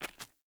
Walk Stone, Footsteps; Player; Mother

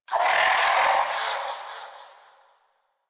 Shadow Vocal Death; Warframe; Duviri

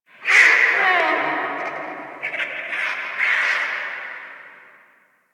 Dungeon Island Vultures, Ambience; Warframe; Duviri

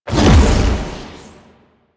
Howl Jump; Warframe; Voruna

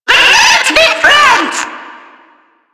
Proto Infested Ancient Tentacle Swing; Warframe; Abilities

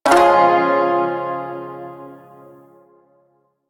Shawzin Phrygian Chord B, Music; Chords

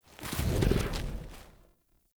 Wolf Steps Run Stop; Animals; Foley Actions

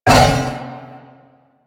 Wolf Attack Short; Animals; DE; Warframe; Quests; Duviri

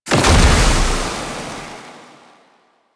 Alchemy Grenade Explosion Toxin; Warframe; Entrati

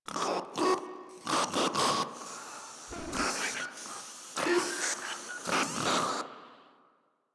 Proto Infested Runner Idle; Warframe

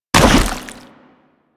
Nechro Mech Step Surface Goo; Step Surfaces

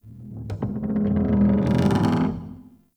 Cave Ocean Dock Creak; Ambience; Midrath Island

